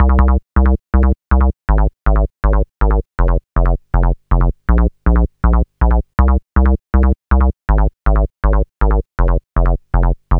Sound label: gro 160, Hardcore Loops, Bass Loops; Dance Synths